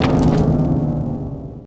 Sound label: piano play broken; Max Payne; manor